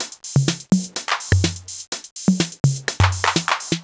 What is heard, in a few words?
drum loop clip top 125, Classic Chicago House; Sample Magic; Drum Loops